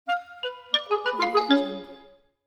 short orchestral cartoon

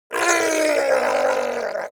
creature fishman shout pain

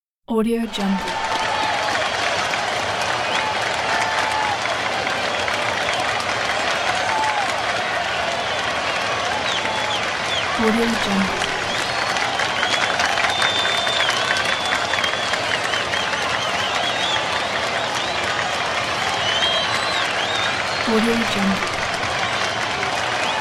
audience

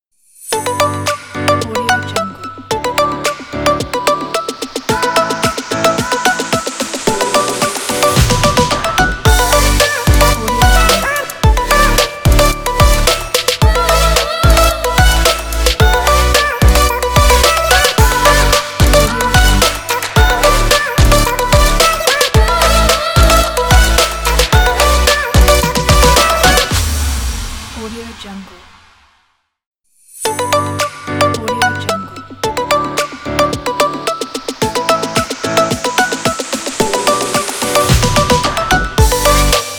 uplifting lifestyle pop logo

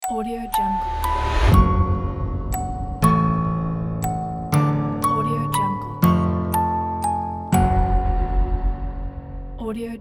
mystery horror podcast intro logo

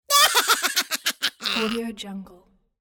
cartoon bad boy laughter

